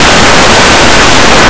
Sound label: ice